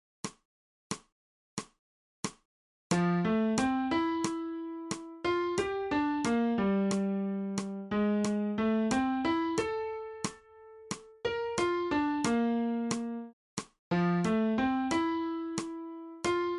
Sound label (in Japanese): 新フレットレス